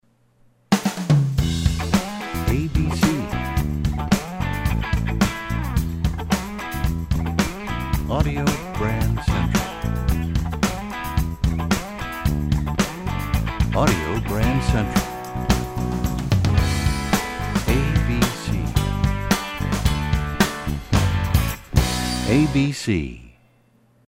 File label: American Country, radio jingles